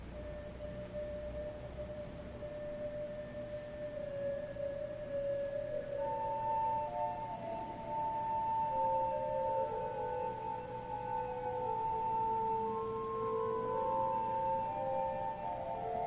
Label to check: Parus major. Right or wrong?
Wrong